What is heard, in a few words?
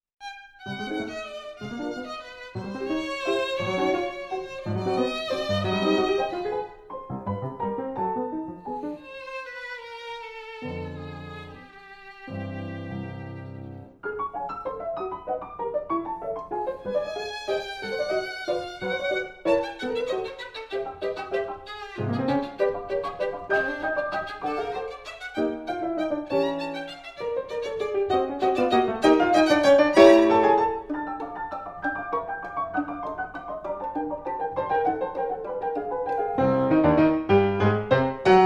Reger Sonate